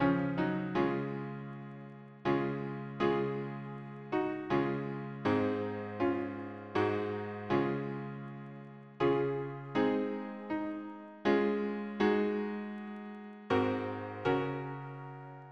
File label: harmony piano